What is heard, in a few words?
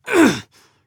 Grunt Slow; Realm Royale; Assassin; Anarchist